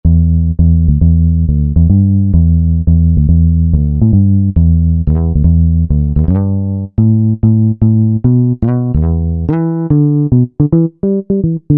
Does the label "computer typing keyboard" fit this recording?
No